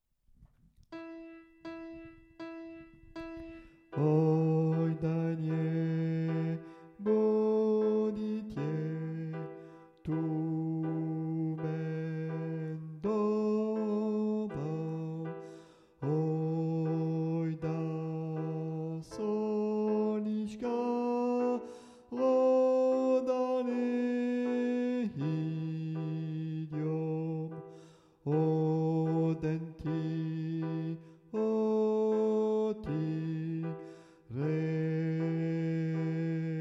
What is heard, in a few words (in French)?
luba hom, chants; Lluba ( hommes)